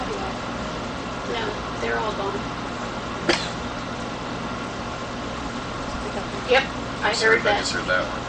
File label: bang